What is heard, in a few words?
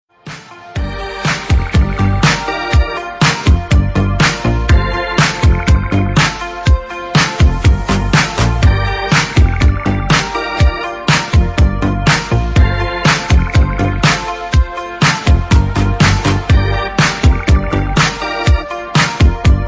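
instrumental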